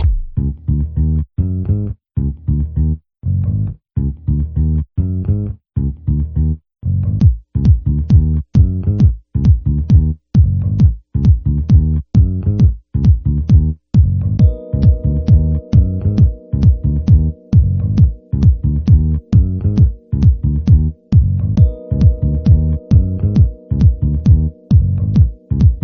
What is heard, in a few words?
string